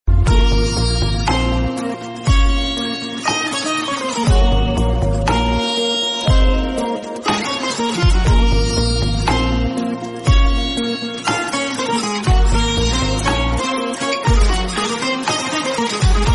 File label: soft guitar sound